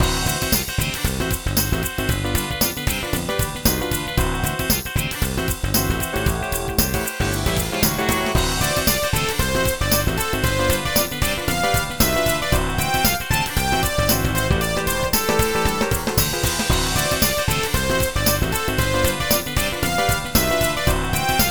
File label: funk; WorldsChat